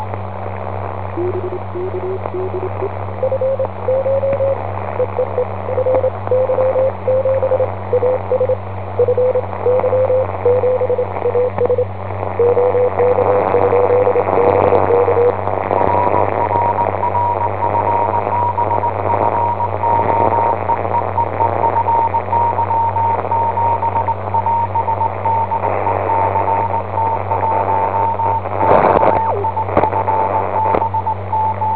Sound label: cw; ao-6; FY7AS